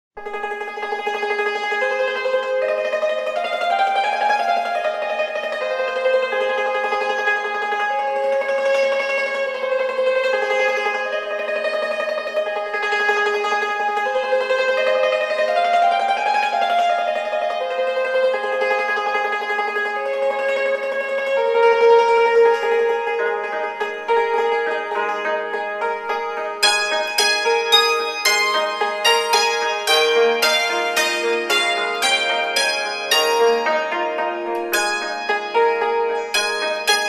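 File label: hymns and medleys on chinese hammered dulcimer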